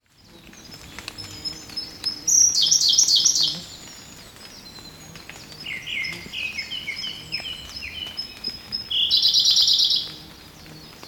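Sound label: koruri, blue-and-white flycatcher; bird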